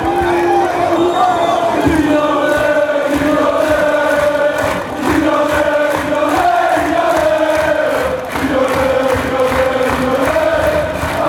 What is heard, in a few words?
fan chants; lyonnais lyonnais